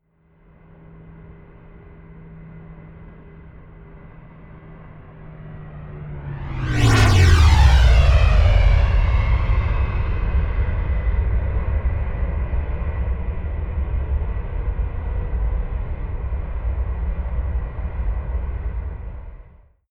plane bombardier learjet flyby right to left close